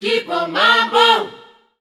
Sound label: Bb 120, Voices Of Africa Sung Phrases; Kipo Mambo